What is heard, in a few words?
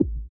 THROAT; Spectrasonics; Metamorphosis; THROATERS